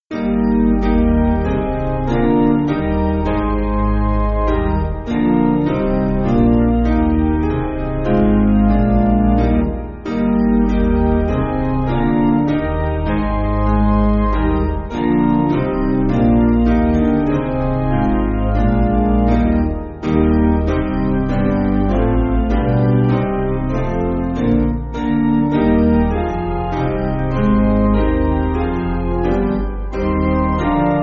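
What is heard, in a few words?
Piano, Free Music Backing Files for Churches; O Lamm Gottes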